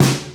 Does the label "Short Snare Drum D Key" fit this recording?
Yes